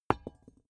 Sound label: small metal drop wood soft; industrie wirtschaft; metall